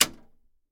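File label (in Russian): щелчок светового выключателя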